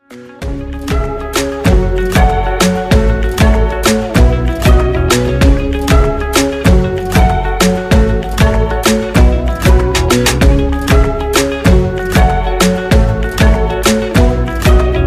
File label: Instrumental